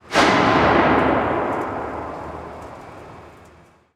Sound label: Hit, earth steel